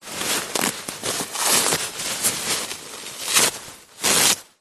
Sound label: household toilet paper mass rip tear; zapsplat